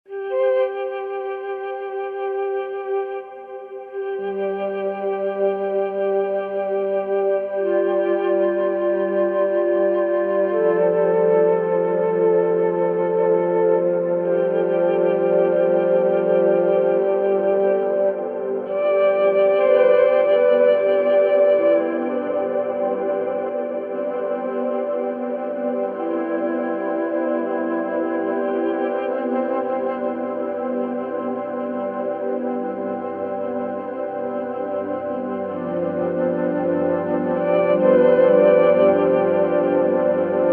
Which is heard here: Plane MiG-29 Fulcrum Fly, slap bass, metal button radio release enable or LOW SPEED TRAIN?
LOW SPEED TRAIN